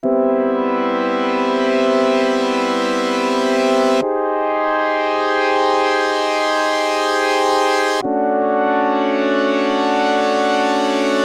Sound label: MOD Devices MOD DUO X J6 Shiro Phantom Zone Dim D Mode